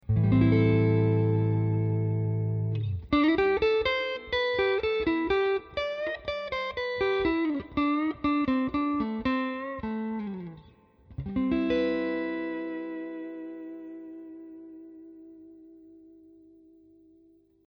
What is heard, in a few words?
melody